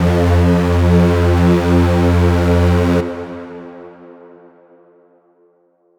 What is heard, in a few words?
lead dark F; leads